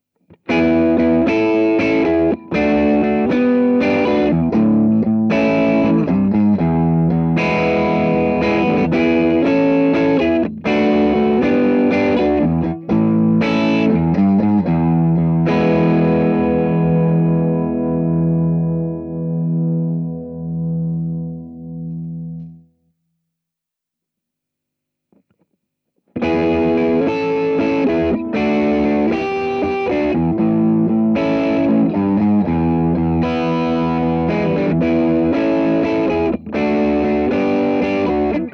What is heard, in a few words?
top boost; randall; mts; re20+boost